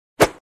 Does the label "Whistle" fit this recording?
No